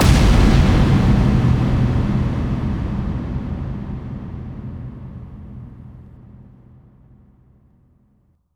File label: Bassdrums FX, FX Kicks; Vengeance Samples